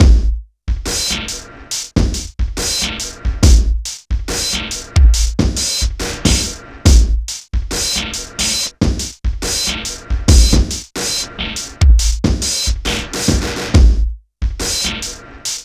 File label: TRIPHOP; INIS; Funky Xtreams